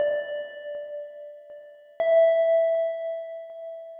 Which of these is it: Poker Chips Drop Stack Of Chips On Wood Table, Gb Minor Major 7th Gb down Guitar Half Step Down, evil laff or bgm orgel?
bgm orgel